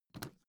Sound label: suitcase lift up soft